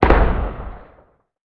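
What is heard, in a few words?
weapon anti-aircraft gun firing distant short; Explosions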